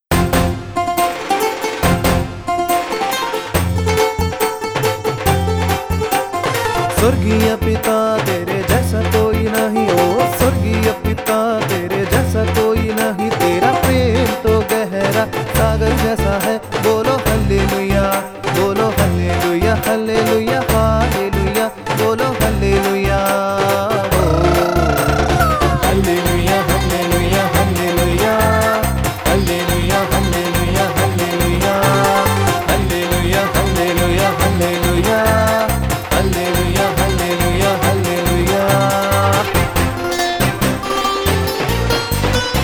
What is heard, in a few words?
Punjabi Song